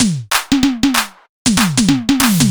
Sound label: Drum fill; Vostok Inc.